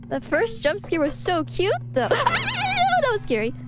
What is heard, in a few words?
jumpscare; IRyScream